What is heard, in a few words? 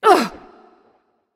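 grunt hit, female; smite; voicepack; hera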